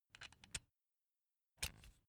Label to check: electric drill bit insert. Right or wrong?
Right